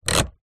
transport car fan heater temperature dial turn

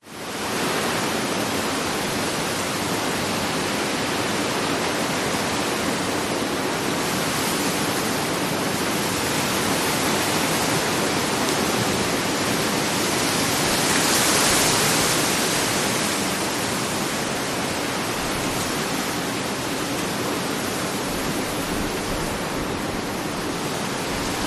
nature wind palm trees constant some gusts

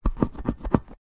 sport soccer ball scratch remove dirt with finger

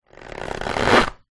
household squeeze mop squeeze dry

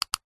technology computer mouse subtle click; zapsplat

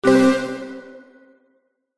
multimedia game tone stab negative; zapsplat

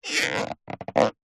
cartoon stretch pull elastic

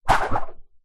rubber cord whip through air whoosh; foley; zapsplat

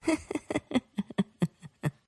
human FEMALE subtle laugh chuckle

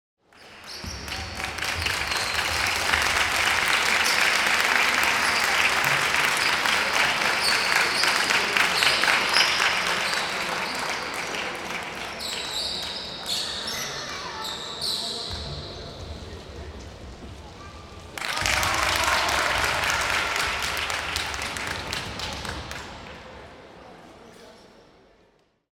Light Applause; sound ex machina

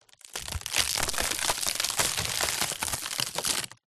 impacts wood collapse break splinter

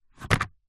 business cards in card box set down

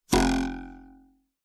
cartoon twang spring ruler

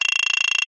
game tone toggle scroll plucked

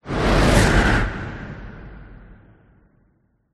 whoosh; orlando lanzini

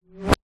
sound design reversed rubber band twang single

